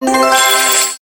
bonus symbol win end; games; Book Of Fortune

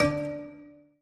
sun disk increment; games; Aztec Gold Megaways

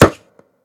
reel stop; Fenix Play 27 Deluxe; Wazdan

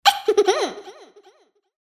moan; Imperial Girls

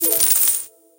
collect money; Wazdan

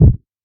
spin stop; Hungry Shark; Wazdan

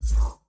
gambler rotate card; Magic Stars 5; Wazdan

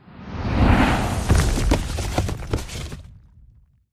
bonus intro; The Golden City

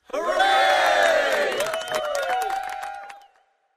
gambler win huray; Hot Party Deluxe; Wazdan

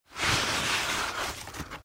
outro scroll; Four Beauties

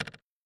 reel stop; Hot Spin Deluxe

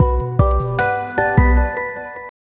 freespin end; Grand Fruits

